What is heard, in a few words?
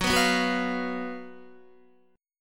Gb Major 7th Suspended 4th Gb, Guitar; GbM7sus4; strummed; 13,14,11,11,11,13; JGuitar